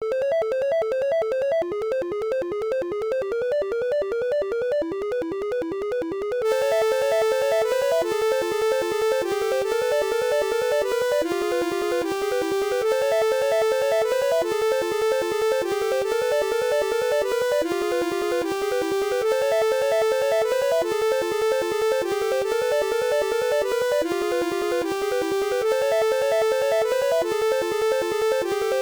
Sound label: journey loopable